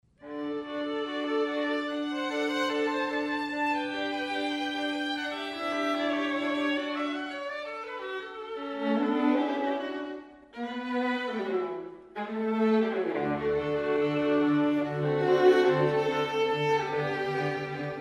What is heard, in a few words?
String Quartet D Major First Movement; Mozart